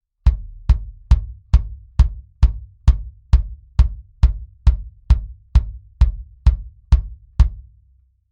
BD Mic Test TechII Mix

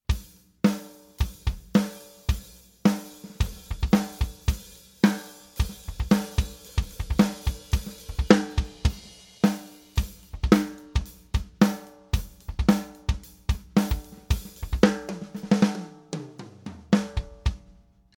Kick Snare Mics solo flat; So klangen die Stützmikros für Kick und Snare alleine - flat; Glyn-Johns

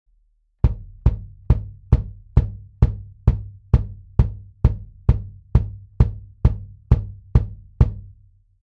BD Mic Test C414B-ULS outside

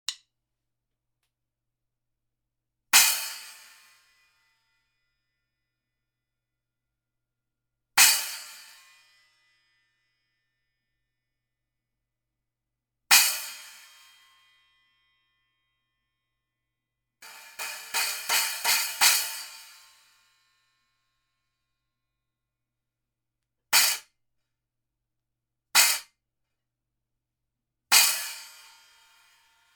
Paiste Splash Stack, Cymbal

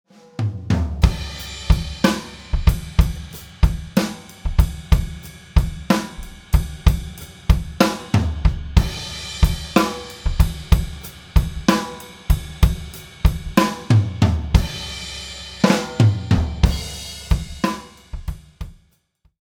Pearl Free Floating Steel, Snares

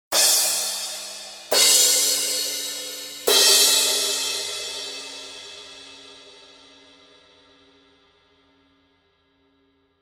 Paiste Crashes

